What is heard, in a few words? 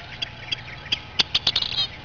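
vermilion flycatcher, birds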